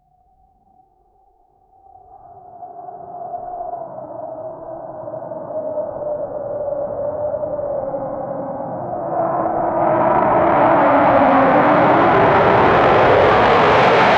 f 16 fighter, flyby; isolated

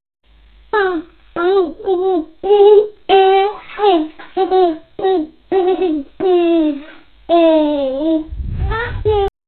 girls exercise at home

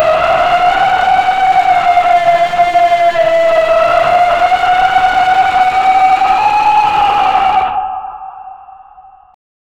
terrifying guttural screa; spectral

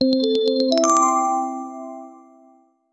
short sound effect, positive

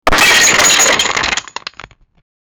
make me a sound of, breaking

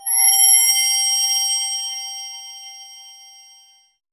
a clean digital bell; crystal shimmer

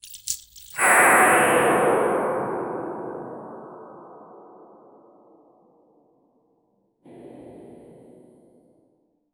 water magic spell cast

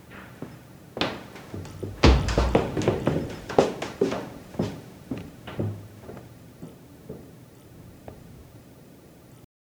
dark old wooden hallway; indoors

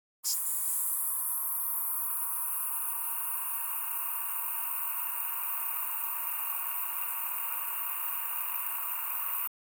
plastic, sound-effects

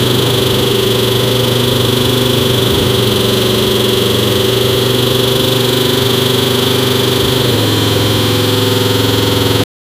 create a v16 engine for, hypercar

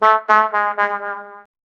anime comedic wah wah

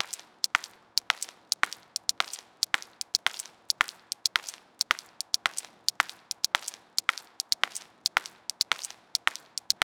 footsteps

